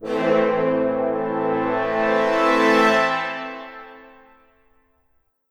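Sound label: Brass crescendo, Movie Score; Духовые сэмплы (Movie Score); film music; духовые инструмент; 80BPM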